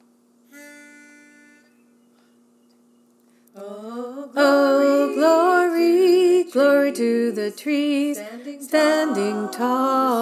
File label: Bass, choir; The Freedom Choir